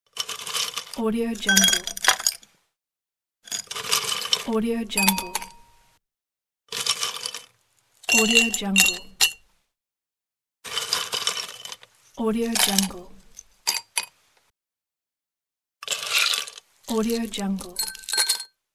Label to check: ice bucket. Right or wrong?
Right